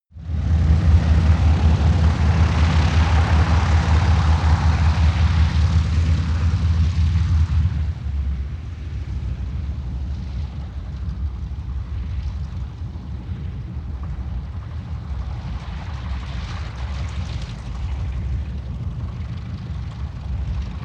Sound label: propeller plane ju52 roll